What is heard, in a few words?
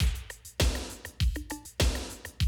drum loop, 100 BPM